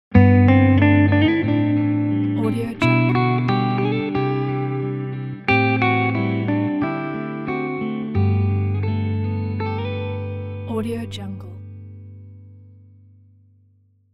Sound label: warm easy listening jazz logo